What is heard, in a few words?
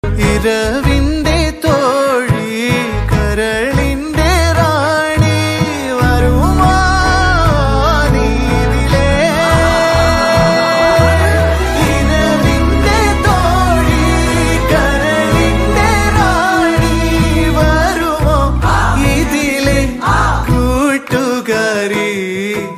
malayalam ringtone; varshangalkku shesham bgm